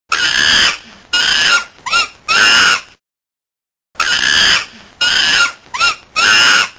monkey

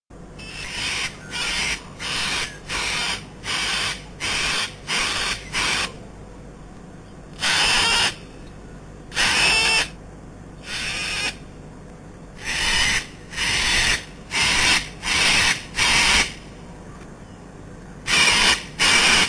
cockatoo

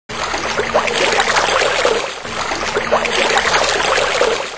stream small

